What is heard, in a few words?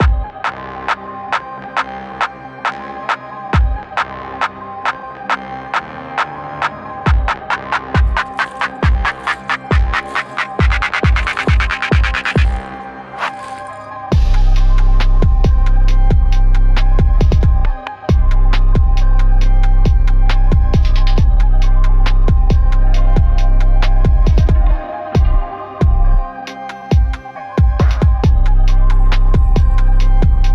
battle theme; meltdown; WrestleQuest